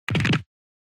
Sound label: Motion Fracture